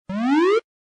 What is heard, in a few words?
GAMBLE WIN; Bank Raid